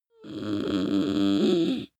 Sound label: witch moan inhale; kreaturen